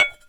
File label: Perc Glass Low; Drums; Misc Percussion